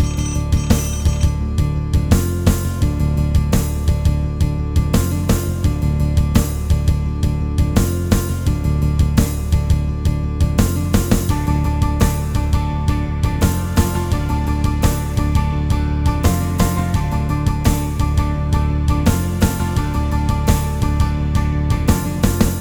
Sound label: ringtone; openmoko